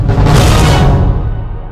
deathcam gg, music; Counter-Strike Global Offensive

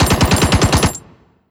infantry fire mg standing; Hearts of Iron IV Death or Dishonor

